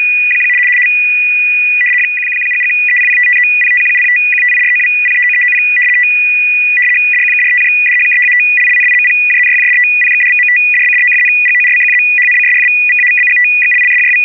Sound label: RUSSIAN DIPLO ITA-2/BAUDOT BASED ARQ SYSTEM